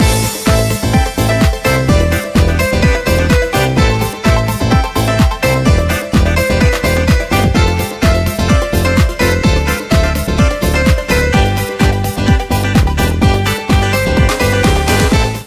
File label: ringtone dance, instrumental